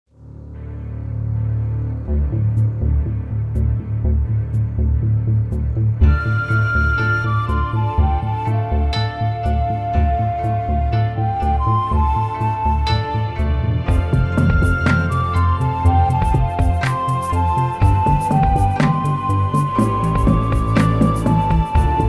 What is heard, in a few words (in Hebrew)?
מוזיקה קצבית